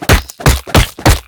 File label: flurry of blows; battle